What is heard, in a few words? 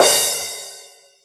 Crash, Club Techno Percussion Cymbal; Zero-G